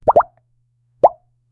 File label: cartoon bubble pop sound